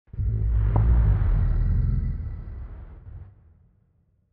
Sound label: Mud Dragon attack; Barotraumatic